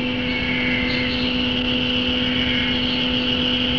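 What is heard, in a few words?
cicada; semi; funagata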